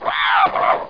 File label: OUCH